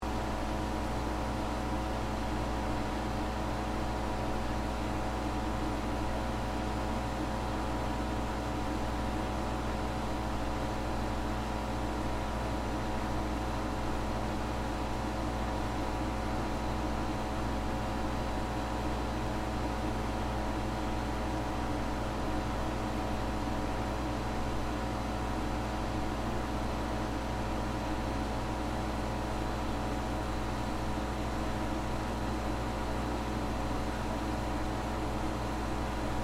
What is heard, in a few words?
Ice Machine, Compressor, Run, Loop; Miscellaneous Bar Sound Effects